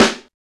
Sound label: SNR, Cheez Snrs, Roland; Club-50 - Foundations Roland